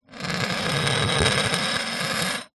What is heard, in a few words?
household garden trowel scrape on concrete